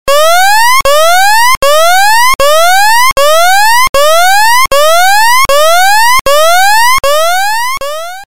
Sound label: Alarm